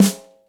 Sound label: Snare Sound G Key, snare drum sound tuned to the G note. Loudest frequency: 1671Hz